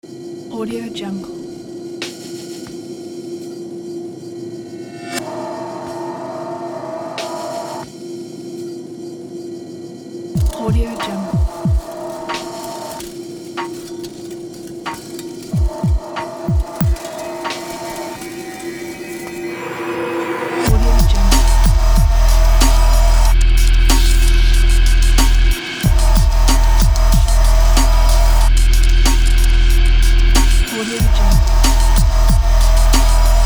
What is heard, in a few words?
a industrial dark